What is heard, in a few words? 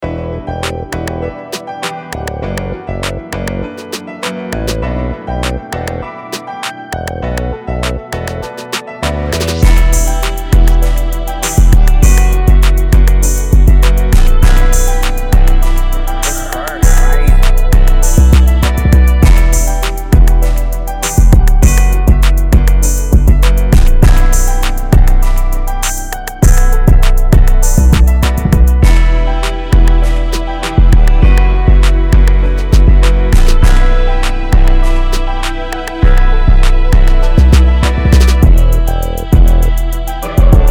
200 b minor, pluggnb type beat